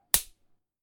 Stick Breaking Knack

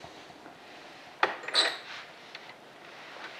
Open Closet

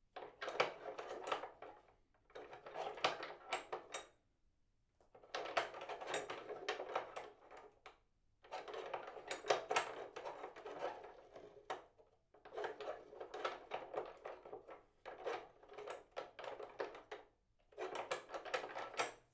Crate Mechanism

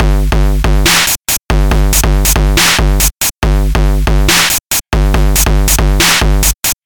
Overdriven Dubstep Loop

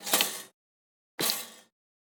Toaster

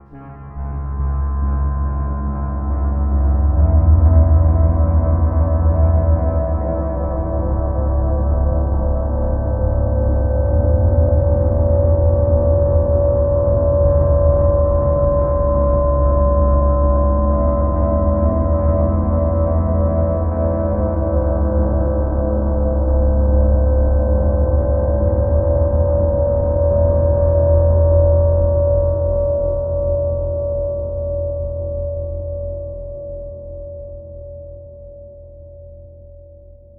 Creepy Foreboding Horror Music

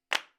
Hand Clap

